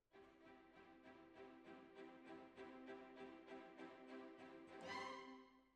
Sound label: Clarinet